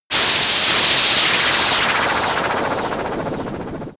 short spinning fire

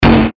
dang

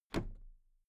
toolbox close drop lid very soft

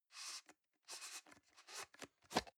plastic can small lid screw open soft

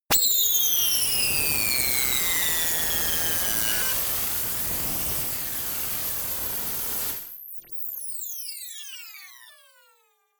noise jet fall

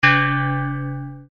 gong

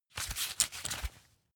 leather belt open heavy

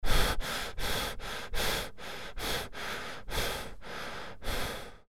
wheeze

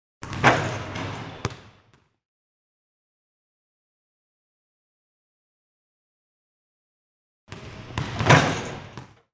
basketball at basket fling

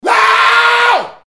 scream

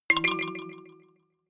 notification kalimba delay second up

